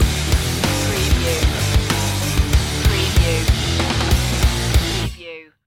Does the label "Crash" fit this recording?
No